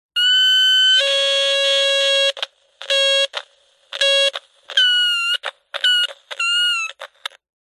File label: cb radio cheap static and feedback through speaker